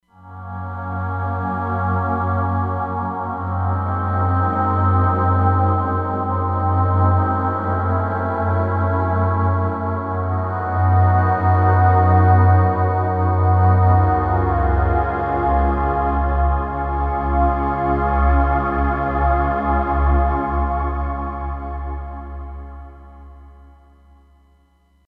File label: Digital Aahs, Roland Fantom XR